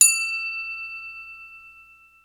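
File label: finger bell; Greytsounds VOL-1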